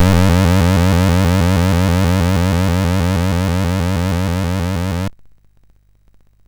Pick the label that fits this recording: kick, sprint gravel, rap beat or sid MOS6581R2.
sid MOS6581R2